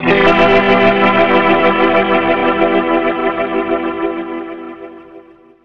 Guitars, 170, Emin; Total Drum&Bass; 90 s Sample CDs

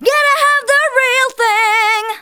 VOCALS SUNG; Techno Trance Essentials

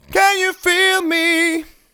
Male Vocals; Can You Feel Me

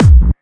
hard bass drumz

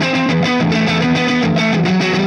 Clinical Distorted Funk Guitar

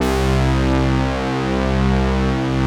BRASSTARD, PADS; Masterbits; Vintage Voltage

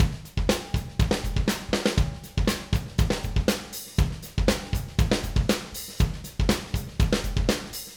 Acoustic Live Drum; 90 s Sample CDs; Platinum Audiolab

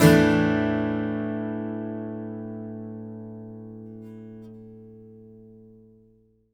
ACC GTR SUS; Country

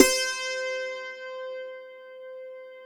DULCIMER C5; SoundBytes; Early Patches

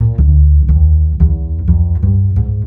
ACID JAZZ

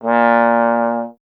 Trombone A#

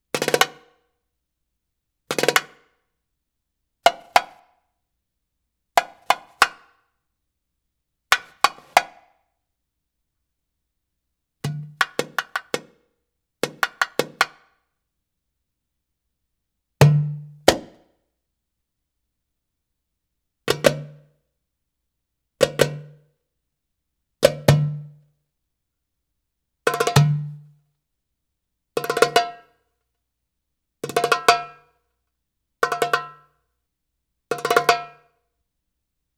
Arabic Rhythms

